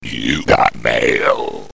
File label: Belching Mail